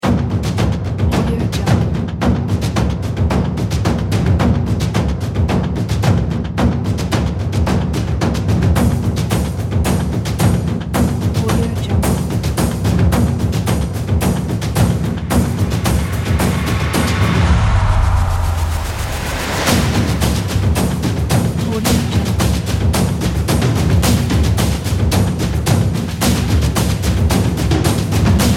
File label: epic cinematic percussion